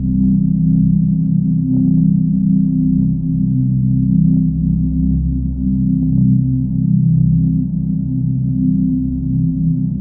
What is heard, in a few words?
lounge loop; jenquai; Earth and Beyond; EA Games